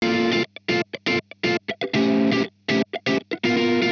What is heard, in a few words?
amp guitar funk; Morphdrive